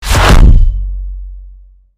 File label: survival double reward sound